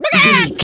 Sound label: chicken cluck scream long